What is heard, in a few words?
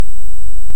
Hydrophone; OneOcean